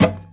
Nylon Guitar; E-MU Producer Series Vol. 8 – Platinum Phatt

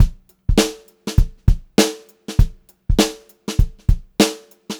BEAT, Funk-Groove Drumloops

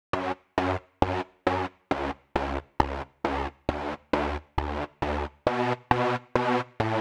135 Detune; Zero-G Trance Inducer; 90 s Sample CDs

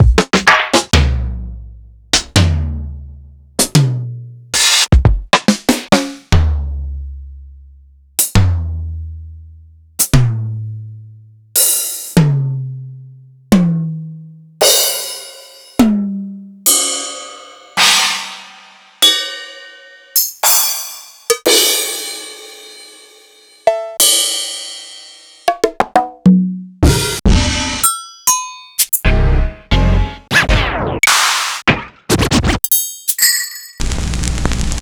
Yamaha RS-7000 Hip Kit

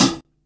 hi-hat; Hi Hats; R&B Drums

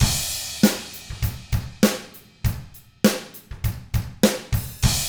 ROCK, Drums; ILIO; Double Platinum Drums 1

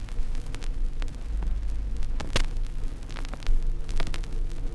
Crackle, Vinyl Noise

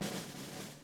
SNR, DRUM ZONE; Masterbits - Total Zone

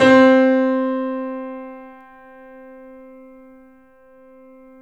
The Piano C; InVision Interactive - The Piano

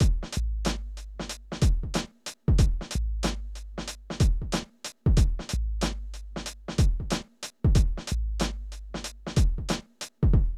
Drum loops, Hip-hop; Zero-G Groove Construction (1993)

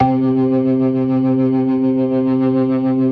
DRAWBAR VIB, Synth and Organ

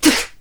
NOISE, CLASSIC VOX; Best Service; Hallelujah

